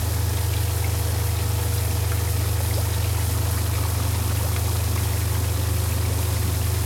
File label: Laser Loop; Caffeind Founder